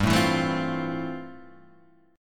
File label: F Major 7th G; Fmaj7/G strummed; JGuitar